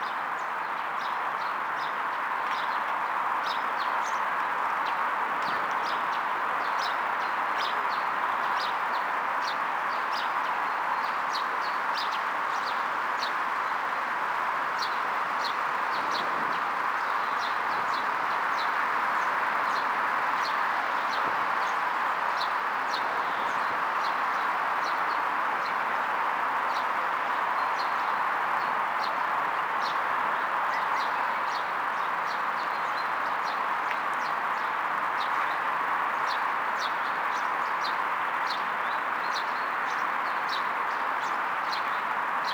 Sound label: Bird; Project Gotham Racing 2